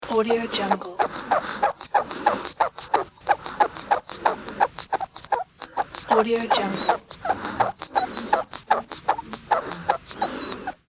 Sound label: baby with soother